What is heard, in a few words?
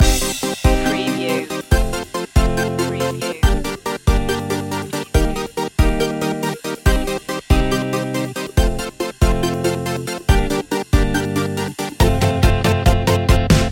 full city loop